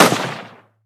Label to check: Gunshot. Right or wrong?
Right